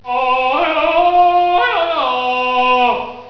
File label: tarzan